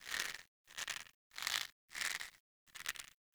Hanging Sign Swing Loop; Objects; Dendrit

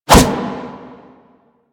Melee Kick Whoosh; Warframe

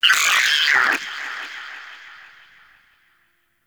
Shrimp Lantern Vocal Pain, Creatures; Baliq

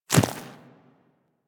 Infested Small Walk Dirt, Footsteps; Warframe

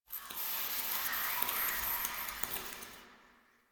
Static; Zariman; Table For Two; Drifter

